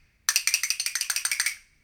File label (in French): castagnettes